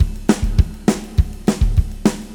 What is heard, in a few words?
Beat Loops; Zero-G - Monster Beats